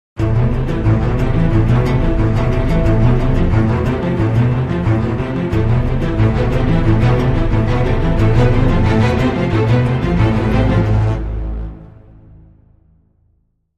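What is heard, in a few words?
Crime Sting, No Percussion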